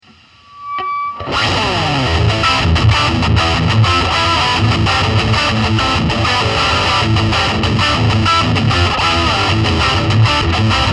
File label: Red Channel High Gain Tone 1